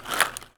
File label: Foley